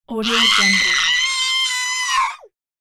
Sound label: child scream in pain